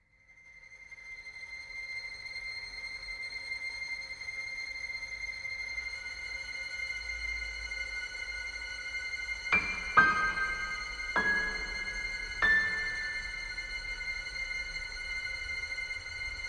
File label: bgm